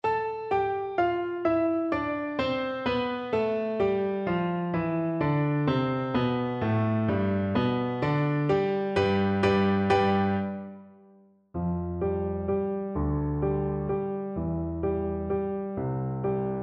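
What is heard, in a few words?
lake, marimba; Percussion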